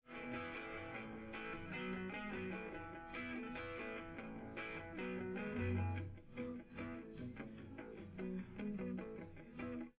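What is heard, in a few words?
with drums, live rec; SiSEC11; underdetermined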